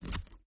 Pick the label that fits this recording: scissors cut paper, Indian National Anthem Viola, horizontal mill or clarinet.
scissors cut paper